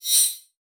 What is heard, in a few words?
Multimedia Internet CD-Rom Flash Short